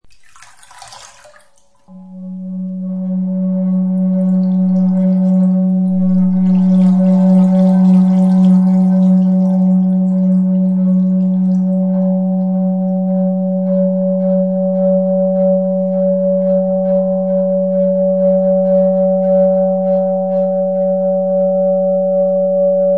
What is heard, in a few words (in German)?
Wasserspringschalen; Klangschalen